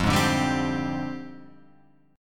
F Minor 6th Add 9th, Guitar Open F chord; JGuitar

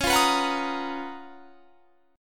Db 13th Db; strummed

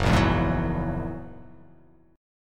F sharp 9th F sharp, chord

